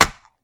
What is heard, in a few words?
egg hit